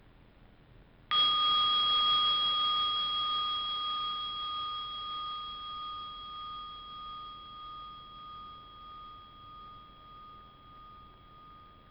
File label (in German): Gongschlag